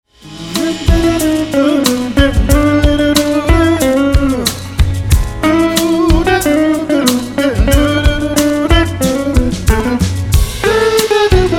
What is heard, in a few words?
Guitar snippet